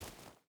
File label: footstep barefoot gravel walk; Rust